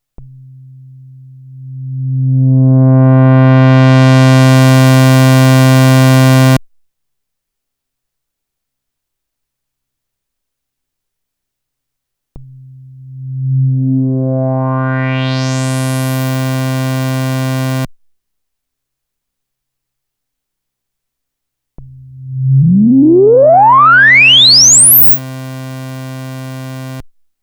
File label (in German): Filter Resonanz